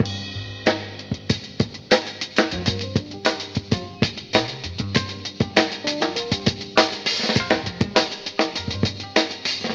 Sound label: creeping jazz, Drum loops Funky